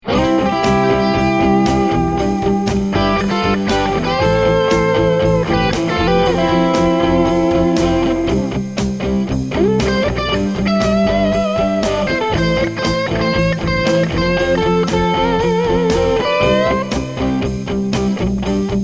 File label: major pent twin